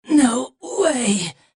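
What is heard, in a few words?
spy pain critical death; Adachi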